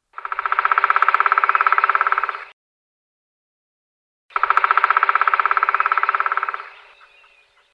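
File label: black woodpecker drumming; Dyatel chernyy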